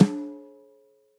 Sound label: SNARE brushes center